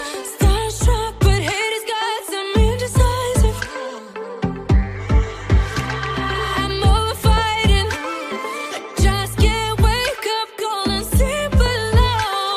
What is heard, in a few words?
new english song ringtone